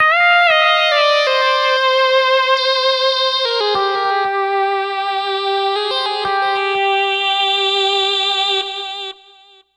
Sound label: 80s Lead; Dr. Fink's Funk Factory